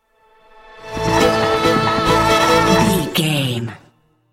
dark showdown stinger

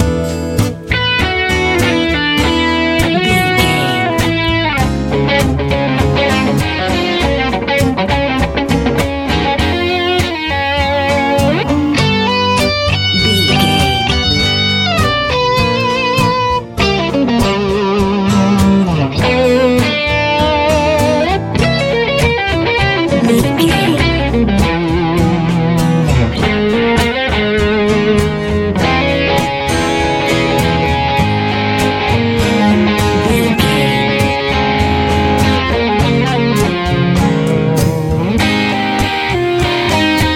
easy listening

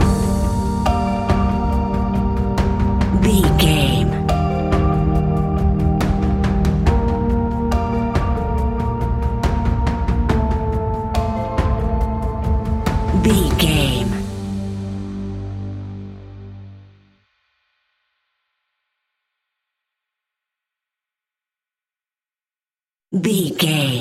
ambient pad tension

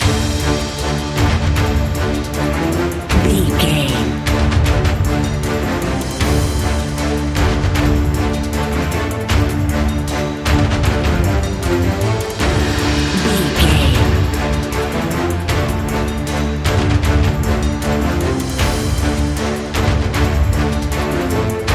epic boss fight narrative loop

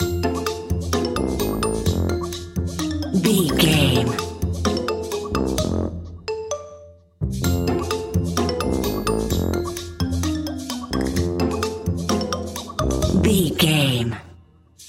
wacky inventor loop

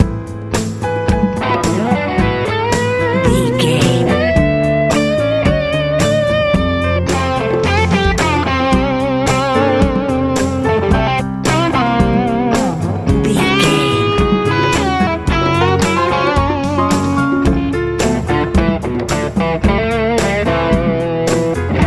good hopeful easy pop

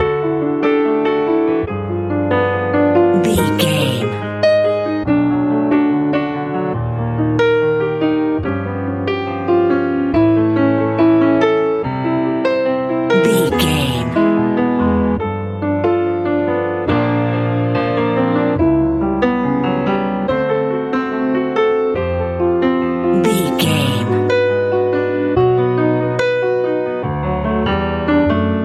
lite pop

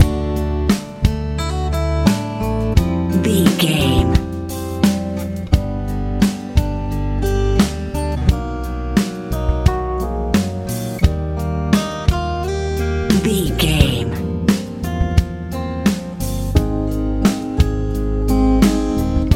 lite ballad

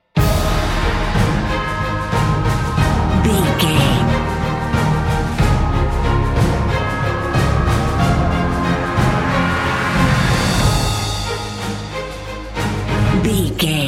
cinematic corporate

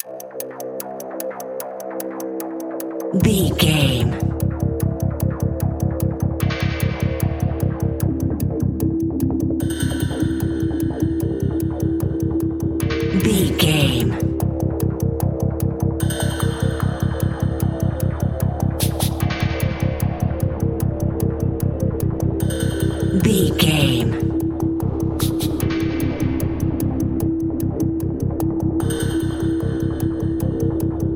suspenseful ticking narrative

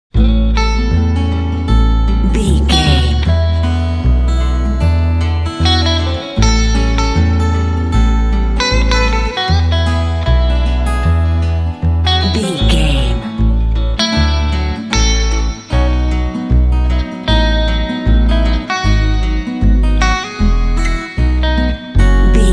twangy country blues

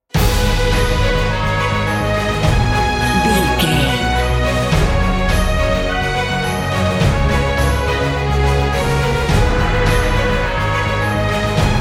epic cinematic; all according plan

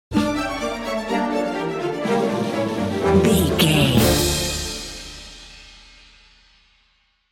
time running out stinger

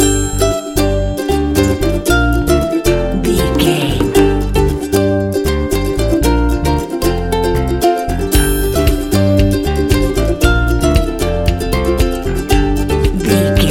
happy ukulele loop

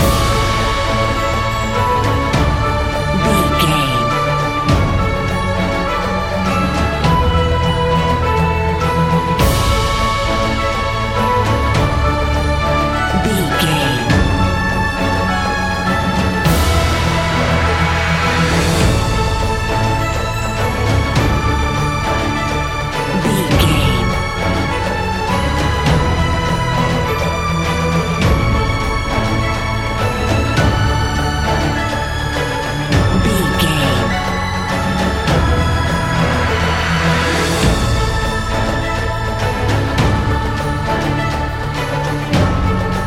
cinematic corporate loop

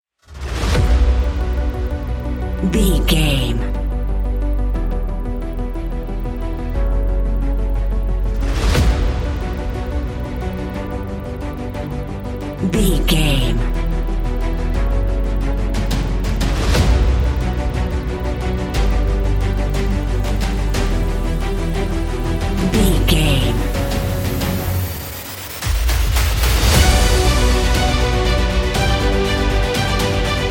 epic hybrid cinematic

